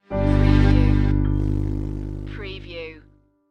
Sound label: Scifi Audio Logo Ident, Classic, Appear and Glow